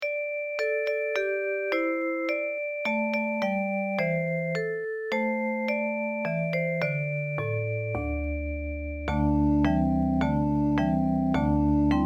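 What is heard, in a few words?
children's songs vocal & guitar; 4 children's songs; nara no daibutsu san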